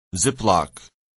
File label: zip lock